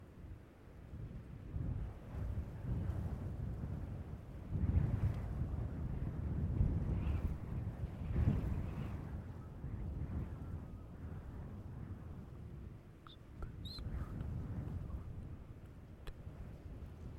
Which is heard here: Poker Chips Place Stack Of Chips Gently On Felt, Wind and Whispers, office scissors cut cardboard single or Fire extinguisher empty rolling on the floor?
Wind and Whispers